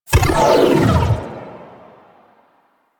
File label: Calm Cast Burst; Warframe; Anima; Anima Animus